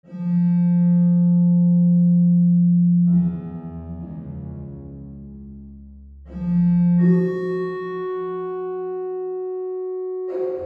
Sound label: random notes, mono synth; reaper